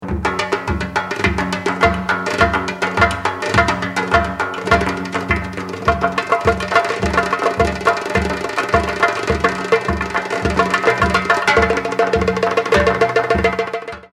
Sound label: Tabla Talk